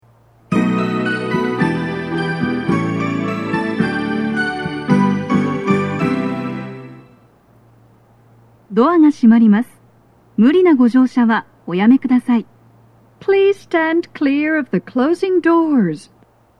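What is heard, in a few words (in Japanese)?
departure, 発車サイン音; 2番線 西船橋・東葉勝田台・津田沼方面; 収録者:こたけ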